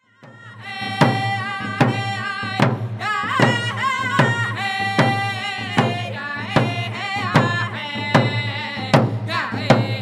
Straight Song

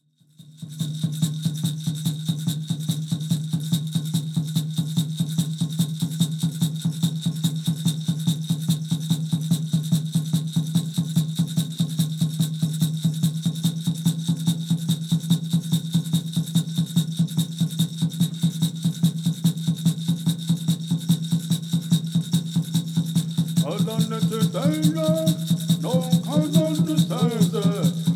Peyote Song

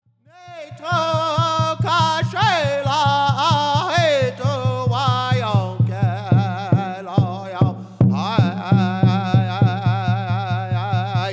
Four Directions Song

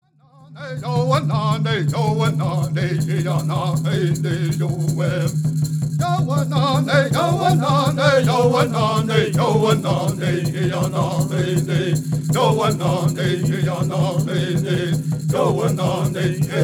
Intertribal Peyote Song

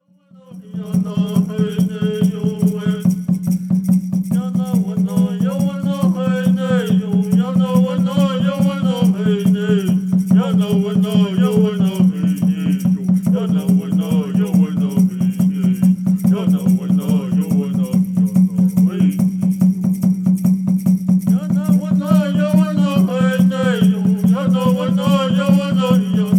Peyote Song

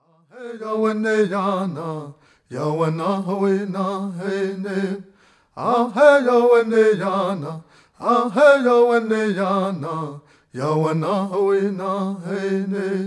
Dineh Prayer Song

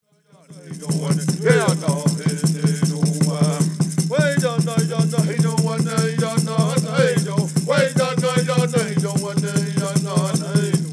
Peyote Song

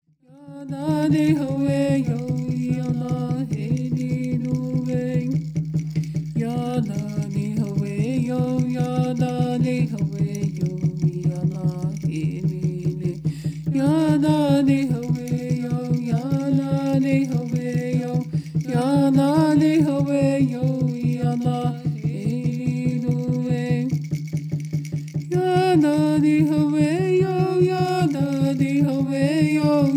Peyote Song

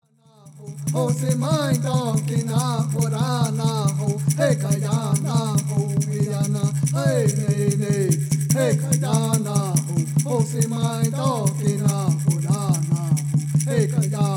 Peyote Song

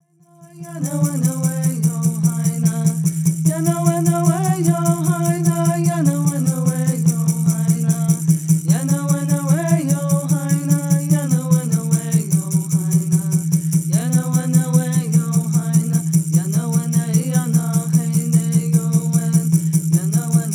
Peyote Song

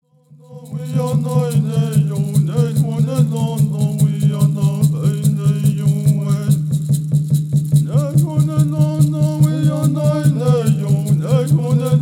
Peyote Song

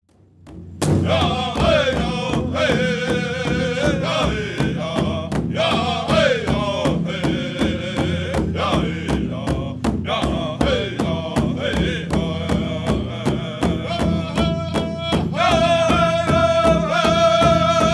War Dance Song

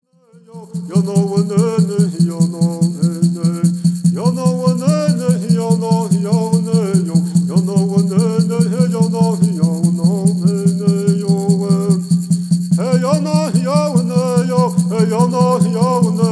Straight Song

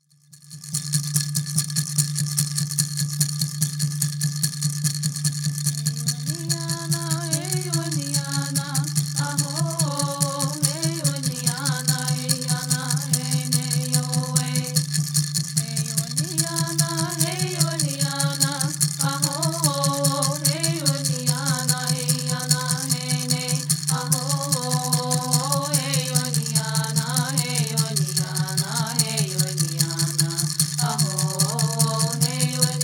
Native American Church Song

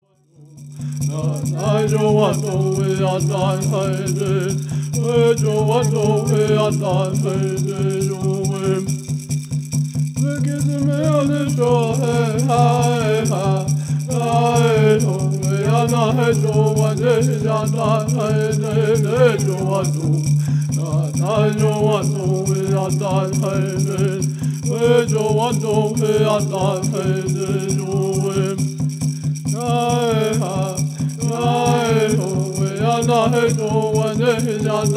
Peyote Healing Song

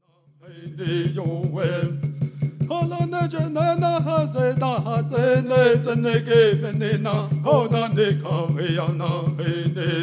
Prayer Song

